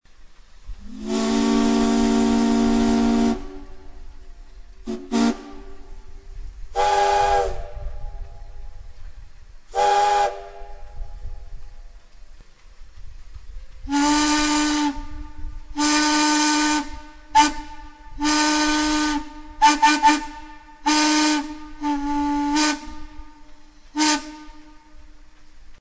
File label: huntington, steam whistles